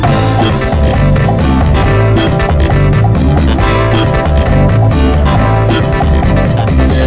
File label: RHYTH, LOOPS